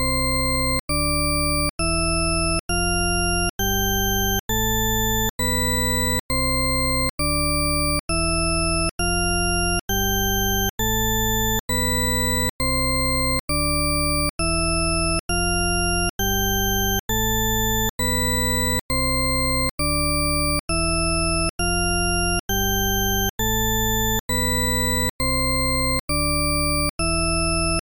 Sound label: Shepard scale